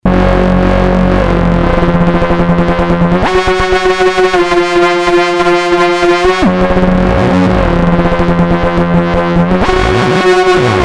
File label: Plan-B Model 25